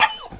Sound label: wolf hurt